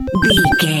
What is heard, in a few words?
retro beeps collect item